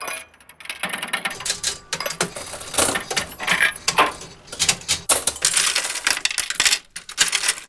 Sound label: slot machine; daniel simon